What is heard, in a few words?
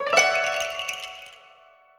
Win; Wu Xing; genesis